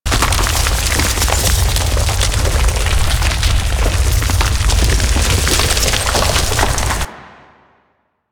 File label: Crystal Growths Path Loop; Warframe; Citrine